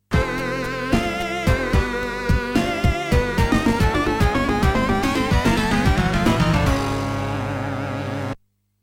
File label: game over, soundtrack, megadrive; sonic 32bit; sonic3d